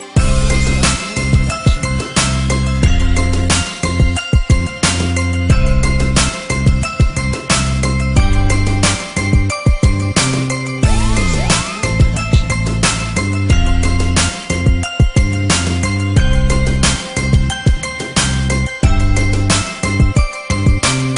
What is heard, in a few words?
new pop beat; ringtones